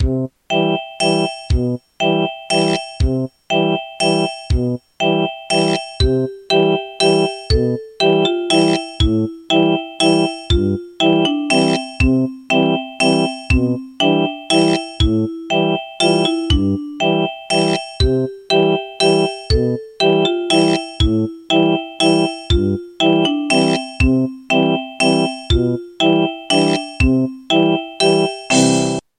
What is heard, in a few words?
bgm; usasasan; tanjoubi